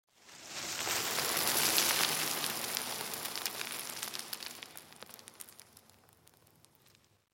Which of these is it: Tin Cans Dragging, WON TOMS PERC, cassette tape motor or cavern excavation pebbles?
cavern excavation pebbles